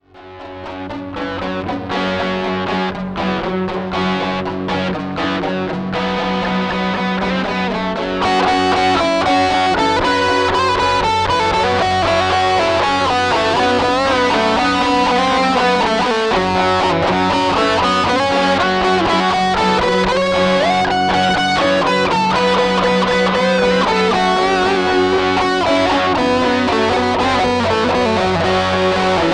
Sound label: Loop